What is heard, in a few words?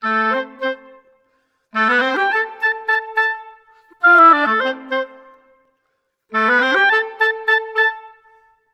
90 A min, Woodwinds; PowerFX; Downtown Orchestra